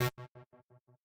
synth

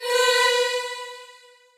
choir

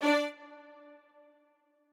piano

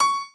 piano

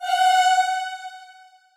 choir

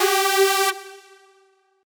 synth

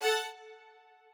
strings

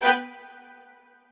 strings

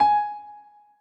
b piano2 v100l8o6gp, piano

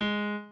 piano

